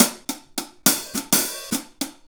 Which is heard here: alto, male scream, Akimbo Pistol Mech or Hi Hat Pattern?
Hi Hat Pattern